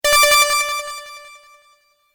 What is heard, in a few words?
Multimedia Alert Sound Effect, Medium Length, Chime; Chimes; Chime Sound Effects; Chimes (Sound Effects:Multimedia); Stuart Duffield